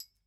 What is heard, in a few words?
Particle, glass